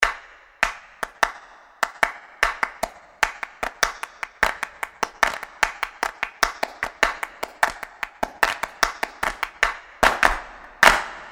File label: claptrak